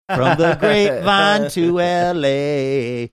singing

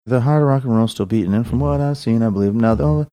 mumble singing

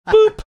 boop

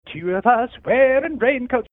singing two of us wearing raincoats

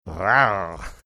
making sexy growling sound

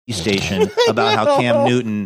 snorting laughing saying no

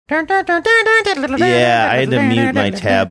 singing

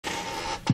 squeaky chair

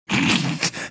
snickering

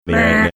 making buzzer sound

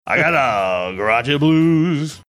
singing i got a crotchy blues

